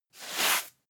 broom sweep hard

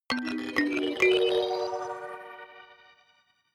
notification kalimba magical triad up minor low

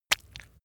leather impact mud medium

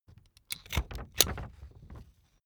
puncher operate heavy

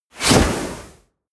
swoosh

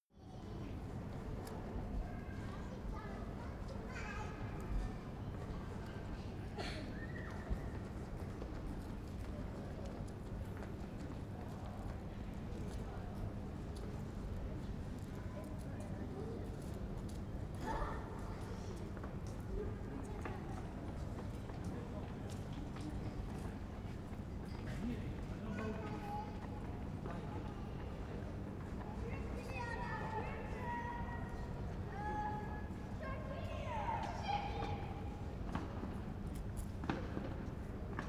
square europe day kids

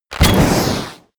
bow shot fire

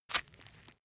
leather impact mud soft squelch

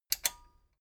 metal button stereo click disable heavy

